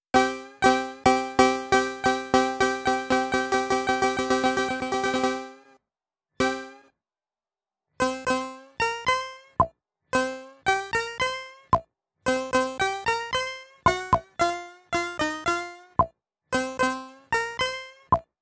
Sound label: Gambling Dice Game Item Shop